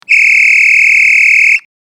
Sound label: whistle, megaphones; thunderpower